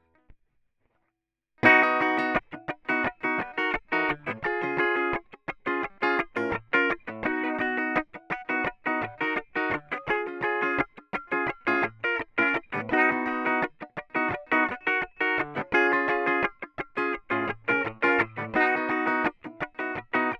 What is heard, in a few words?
strumming; logic